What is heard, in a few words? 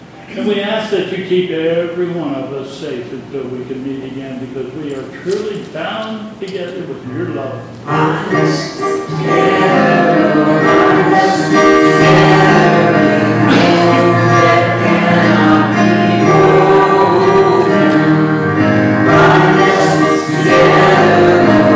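Benediction and Closing Chorus, church service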